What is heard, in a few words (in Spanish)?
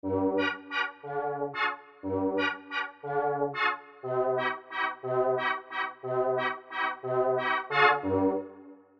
voc acordeon